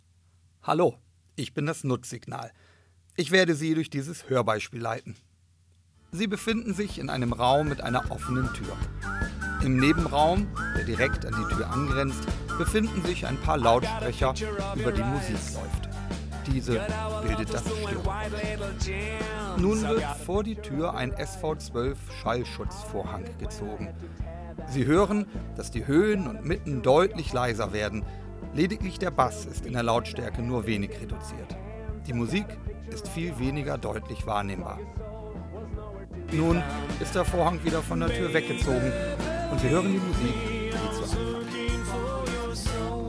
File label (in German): Auralisationsbeispiel Musik